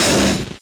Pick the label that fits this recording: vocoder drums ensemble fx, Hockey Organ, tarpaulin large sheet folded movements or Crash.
Crash